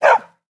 bark, wolf; mob; Minecraft; Votive World

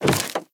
ladder, step; Minecraft; Conquest Default

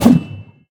strong, player attack; Minecraft; Votive World

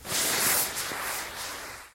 launch, fireworks; Minecraft; Votive World

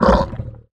ambient, guardian; Minecraft; Votive World

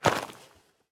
gravel, step; Minecraft; Votive World

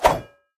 sweep, player attack; Minecraft; Votive World

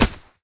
hit, damage; Minecraft; Votive World

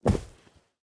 cloth, step; Minecraft; Conquest Default

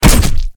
crit, player attack; Minecraft; Conquest Default

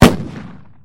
large blast, fireworks; Minecraft; Conquest Default

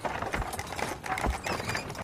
farm walking; Minecraft; Votive Content

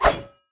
sweep, player attack; Minecraft; Votive World

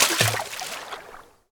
swim, liquid; Minecraft; Conquest Default

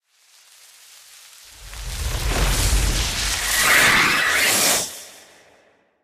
swoop, phantom; mob; Minecraft; Votive World

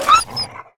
hurt, wolf; mob; Minecraft; Votive World

